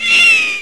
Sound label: scream; Bunny Killer III